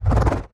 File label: damage hits explosions, Warships